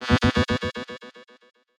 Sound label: Delay Allert; FREE SFX PACK VOL 3